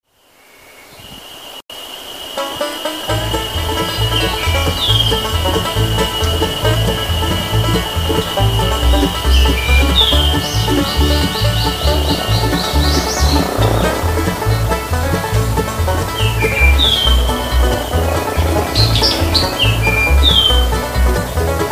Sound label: hojiro, birds